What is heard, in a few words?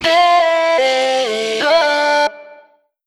phrase Dm, Vocal; Slate Digital Omnivox Vocal Sample Pack